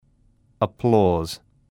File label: applause